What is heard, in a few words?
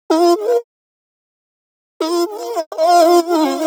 126 F VOCAL LOOP, Funky Underground; Loopmasters; Funky Underground Vol 2